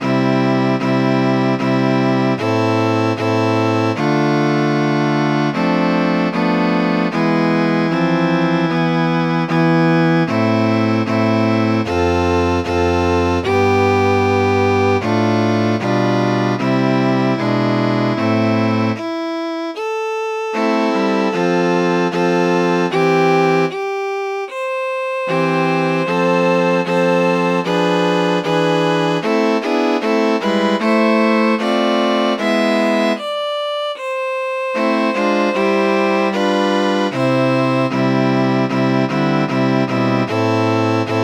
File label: Adeste, SATB